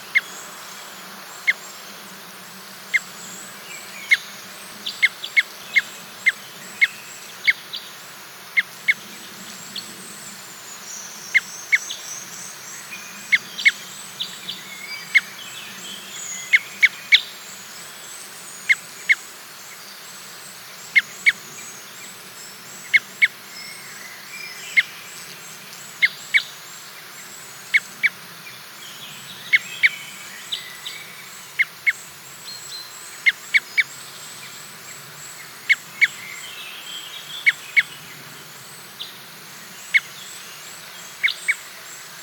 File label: Red Crossbill Type N13 - ECs